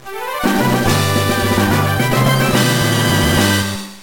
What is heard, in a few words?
Slots Big Win; infinitefusion